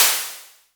Hats, Elektron MachineDrum; SPS1 MKII

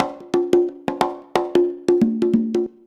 CONGA, Modern Percussion Loops

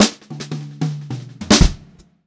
Brushed Drum Loops, fill; USB Soundscan vol.39; AKAI

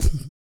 REGGAE, Vocal; ILIO; Vocal Planet; Blues & Soul

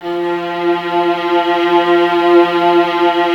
VLS F 3, Violas, String Ensembles; Miroslav Vitous; VLS 1+2

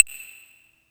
Seq Click H, Yamaha PSR E305

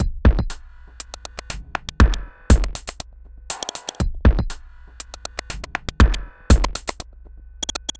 Hi-Hat Esoterica, Beats

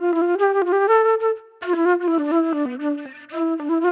Jammy Flute

